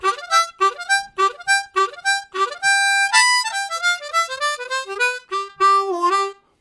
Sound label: Jukey, Harmonica Riffs; Zero G - Harmonica